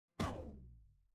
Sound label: retro game hit